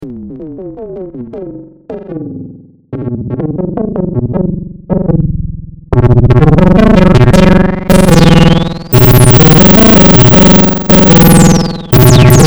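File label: Vacuum Impact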